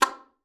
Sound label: SLPA C4, ALTOSAX; Xsample VOL-2